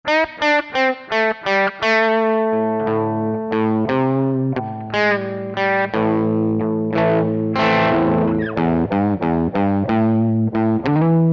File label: TWEEDY, Digitech RP200 Factory Presets